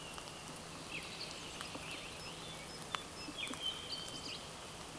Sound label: Parus major